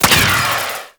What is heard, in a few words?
Proc Corrosive; Warframe; Mods